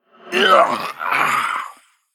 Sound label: Death